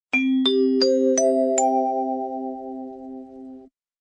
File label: SMS Ringtone